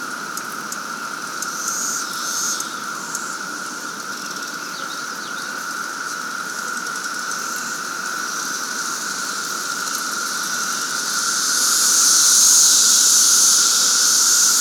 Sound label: Cemetery Cicadas; Horse Creek; Cicada Bloom